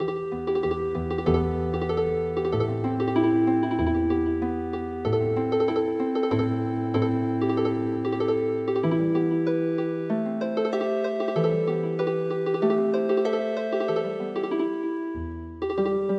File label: Healing Harp, traditional